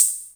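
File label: Closed Hi-Hat, Dance Drums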